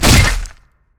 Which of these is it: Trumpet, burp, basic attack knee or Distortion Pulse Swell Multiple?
basic attack knee